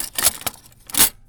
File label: Silverware; BB Retail Therapy Sample Pack